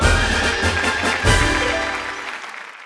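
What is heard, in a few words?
taming success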